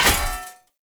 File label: Polearm Medium Impact Metal; Weapon Impacts; Player; Environment; Sphere Polearm